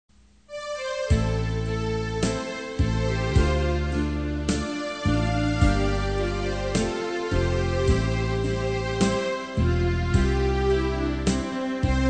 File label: alto